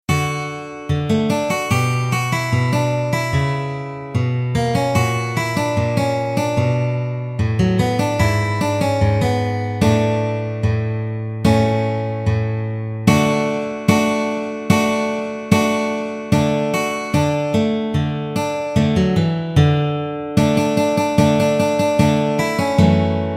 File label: synth